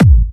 Bassdrums UK Trance Kicks; Vengeance Samples

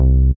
Deep bas; Gangsta Sound Kit

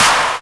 Clap; Vengeance Samples; VEC1 Claps

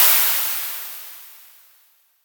Downsweeps

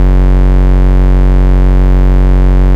Ultimate Bass Root F#; Vengeance Samples

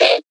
Vocoder Oneshots; Vengeance Samples

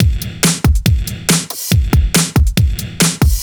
Breakbeat 140 BPM

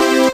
Synth Sound; Vengeance Samples; VEE Synth Shots

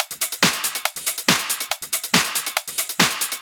Loop 140 BPM; vengeance essential club sounds-3

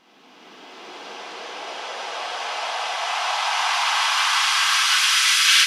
Uplifter

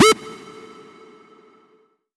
Synth Cuts; Instruments; VES1 Synth Cuts

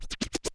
Cuts, Vinyl Record Samples

